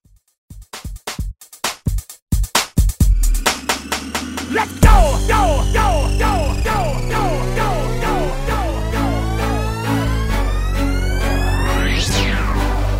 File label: Throwback Redrum Hype